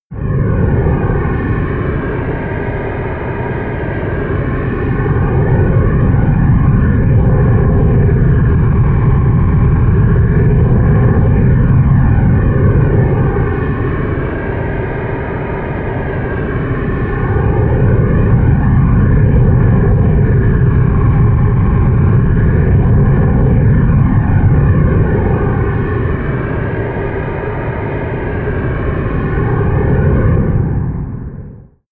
drone jet stream

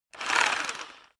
rock drill hammer rev short; maschinen; bohrer; soundpool

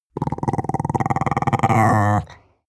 chihuahua growl stutter; natur-tiere; hunde

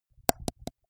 teeth clench multiple; soundpool

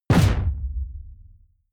sci-fi shot tonal sub noise; schusswaffen

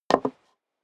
step high heels wood creak single stair down; schritte

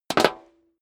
step high heels metal dry jump; schritte; Footsteps; menschen

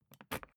briefcase plastic latch lock key pull out

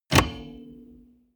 car bmw e46 interior seat lock in

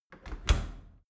dry cleaner close soft; maschinen; tueren

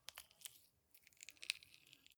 gel dispenser press squish soft; haus garten; badezimmer

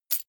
key ring large catch

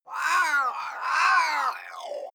crows abstract; natur tiere; voegel

